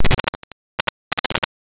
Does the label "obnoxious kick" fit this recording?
No